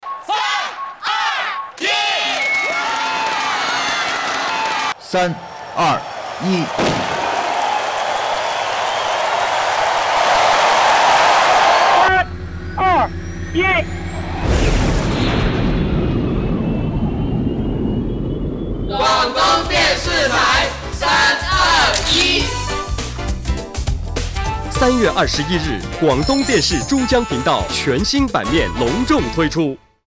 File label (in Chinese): 电视包装音乐